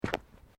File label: Footsteps Boots Road Step; Footstep Foley; Footsteps (Sound Effects:Human)